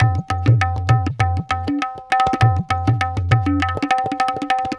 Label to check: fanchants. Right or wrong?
Wrong